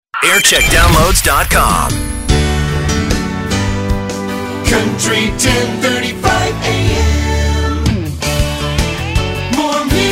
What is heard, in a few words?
jingle montage; country 1035; 1995